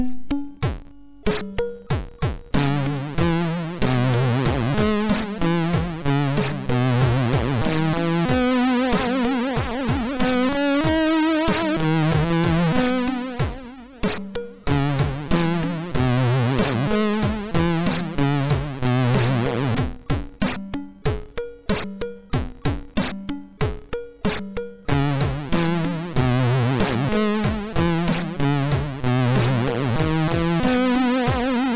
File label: sid, MOS6581R2; Soon Coming tune 2